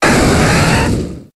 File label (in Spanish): Grito de Rapidash